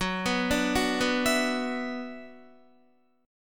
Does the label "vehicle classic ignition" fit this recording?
No